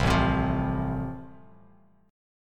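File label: A Minor Sharp 5th, Bass Standard, chord strum; Am#5 strummed; JGuitar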